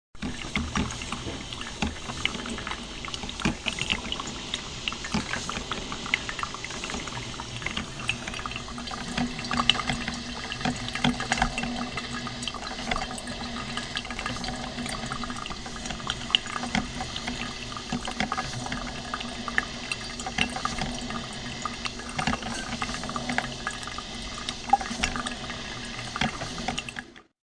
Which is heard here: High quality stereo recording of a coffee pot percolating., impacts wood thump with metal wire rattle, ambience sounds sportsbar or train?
High quality stereo recording of a coffee pot percolating.